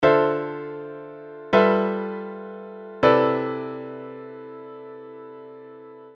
Dm7 9 G7 9 13 Cmaj7 9 13, jazz chord progressions

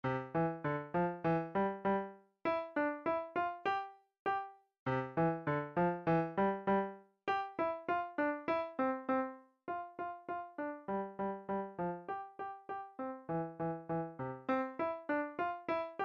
Staccatto hands separately, piano

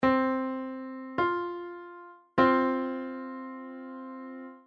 perfect 4th

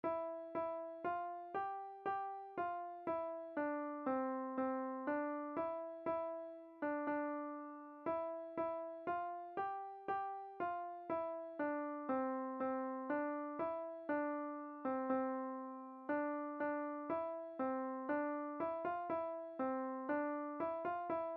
play piano by ear; Ode to joy

